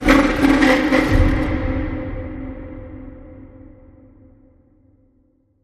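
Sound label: Creature Monster Groans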